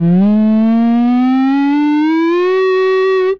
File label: Slide; MMRetroArcadeSoundsPack